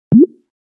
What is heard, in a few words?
connecting, calls